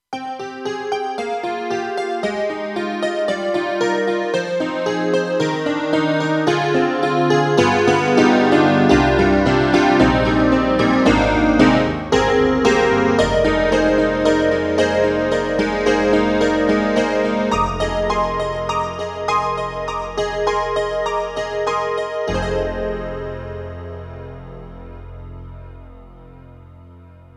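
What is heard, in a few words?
Honky Tonk; KAWAI; GMega LX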